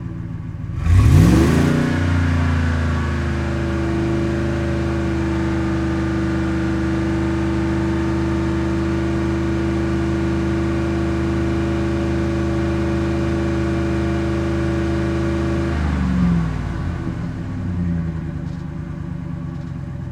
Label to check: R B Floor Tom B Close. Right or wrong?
Wrong